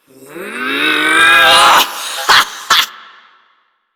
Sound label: Ability Lift Laugh Lich